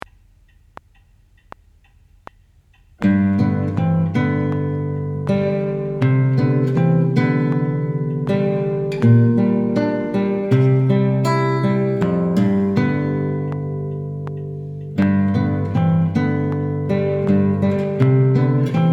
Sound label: Blues, Guitar; Grade 4